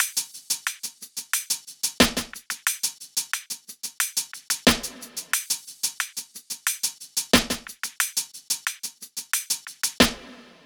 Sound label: Top Drum Loop 90 BPM; Drums; Top Loops; Humble Hip Hop Sample Pack; Institution